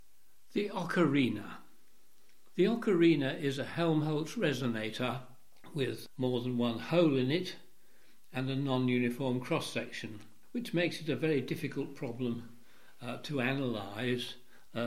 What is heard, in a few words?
Occarina